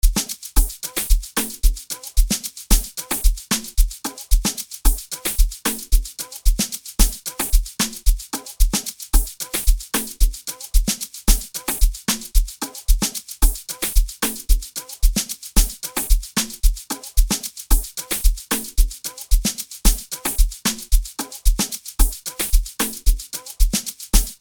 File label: Jingle bells